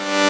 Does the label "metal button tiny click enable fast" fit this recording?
No